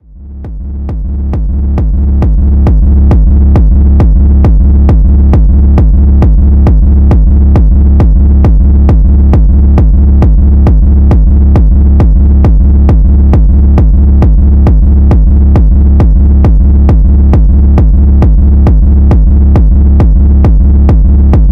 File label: signature kicks; zumstein